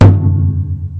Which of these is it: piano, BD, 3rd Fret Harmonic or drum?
drum